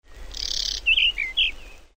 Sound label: whinchat